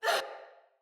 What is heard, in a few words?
Surprised, Soldier Female; Battle; Barks; Dialog; Sinecure Soldier Female A